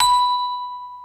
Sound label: Bell B5